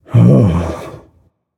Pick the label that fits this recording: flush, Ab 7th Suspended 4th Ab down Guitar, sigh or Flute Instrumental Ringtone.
sigh